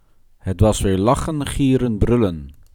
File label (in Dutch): Lachen gieren brullen